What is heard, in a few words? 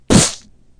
head crunch, kung fu; Kung Fu Construction Kit